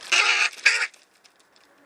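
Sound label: hooded crow corvus cornix cornix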